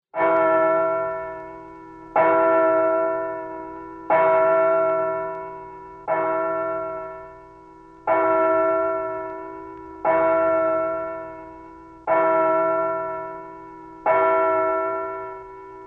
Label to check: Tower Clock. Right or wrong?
Right